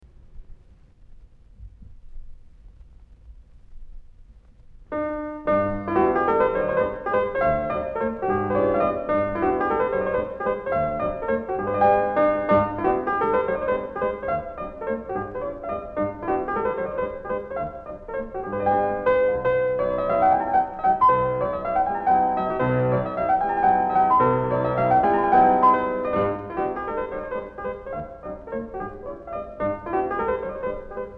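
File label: Mazurka in G, piano; Chopin; Mazurkas; Op posth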